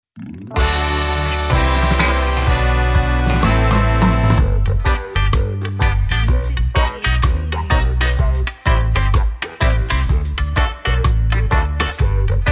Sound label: Groove